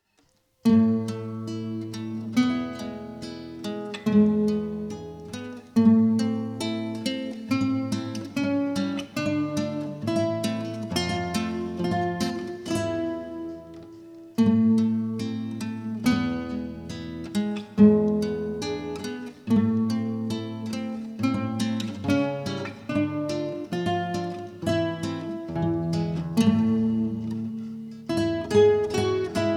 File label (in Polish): Sonatina C dur Adagio; 17. Sonatina C dur cz. II Adagio; D. Steibelt